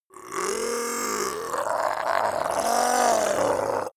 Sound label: zombie human change